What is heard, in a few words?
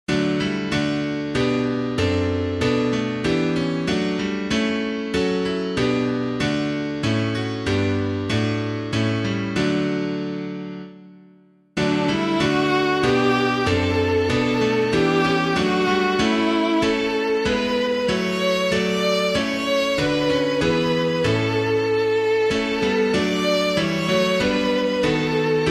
The God Whom Earth and Sea and Sky, piano